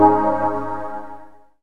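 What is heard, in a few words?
synthesizer Dance; Best Service Gigapack 1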